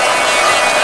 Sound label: horn, Crowd; David Beckham Soccer; XBOX